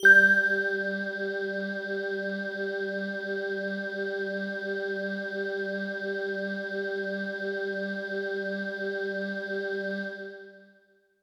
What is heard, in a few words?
Bell Air Kawaii G-1